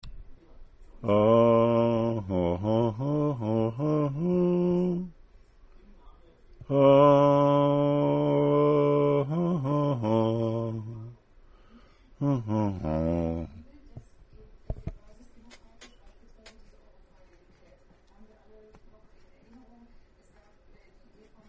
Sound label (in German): nur Töne